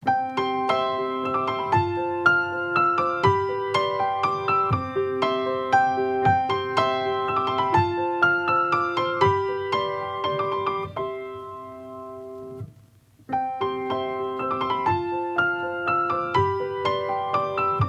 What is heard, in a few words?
The Music Box